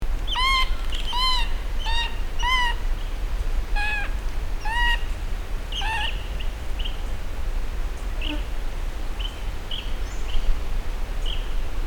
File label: Erythrotriorchis Radiatus; Red Goshawk; Call